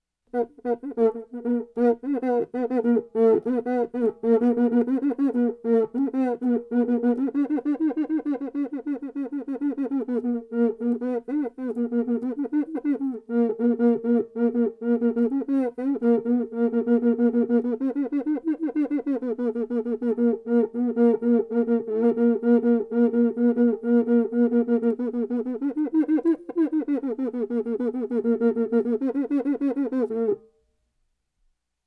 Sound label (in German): guica rhytmus; Suedsee